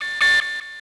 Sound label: Note Found; Max Payne